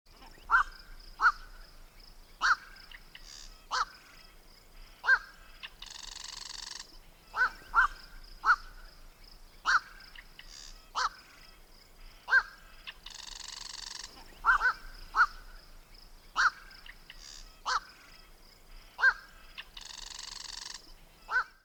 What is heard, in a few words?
Black Crowned Night Heron with Call Sound